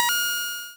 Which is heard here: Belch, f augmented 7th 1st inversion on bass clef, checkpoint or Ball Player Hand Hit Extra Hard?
checkpoint